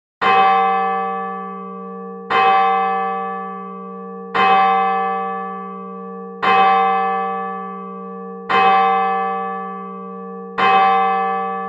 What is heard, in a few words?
bell ringing church bell